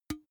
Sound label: big can empty hit damped soft